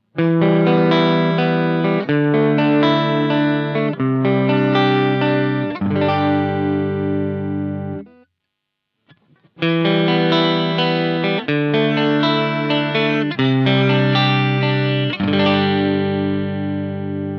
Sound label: S Bucker clean, pickups